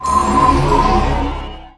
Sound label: cancel scroll